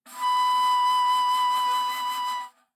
Bass Flute ff B5; Woodwinds

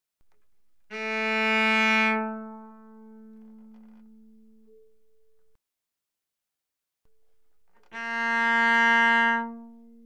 Cello arco mf sul A A3B3; Strings

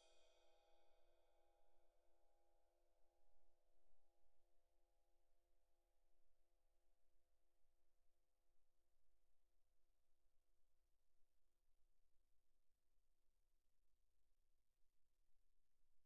ride stick normal pp, suspended cymbals; Percussion

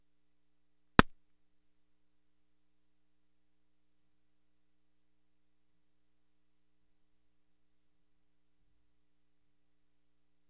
Balloon pop

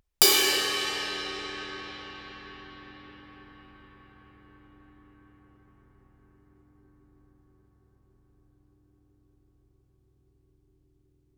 crash stick normal ff stereo, Suspended Cymbals; Percussion

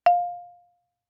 Marimba yarn ff F5; MIS Pitches - 2014; Percussion

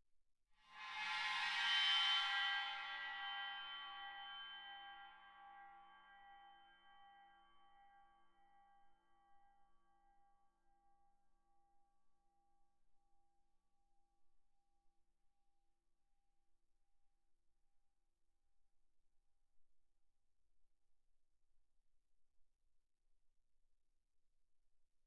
20 chinese, bow, suspended cymbals bowed; Percussion

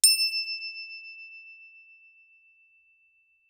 Crotale ff E7 stereo; Percussion; Crotales

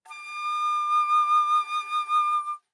Alto Flute vib ff D6 stereo; Woodwinds

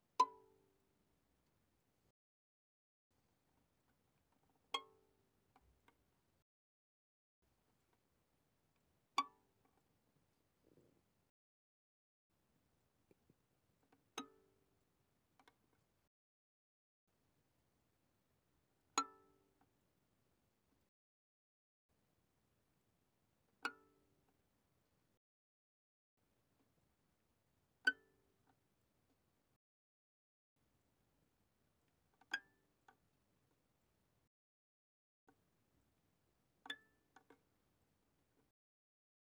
Violin pizz mf sul E C6 B6; Strings; violin2012

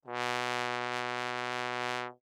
Bass Trombone ff B2; MIS Pitches - 2014; Brass

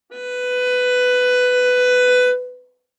Viola arco ff sul D B4 stereo; MIS Pitches - 2014; Strings

